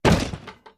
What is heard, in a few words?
Objects Fall Various